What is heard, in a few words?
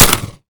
mech; arccw ud